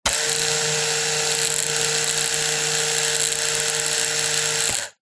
household razor electric male motor whirr close up open; remington